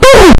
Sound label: death sound extremehurt; roblox